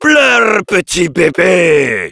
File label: yell; Heavy; fr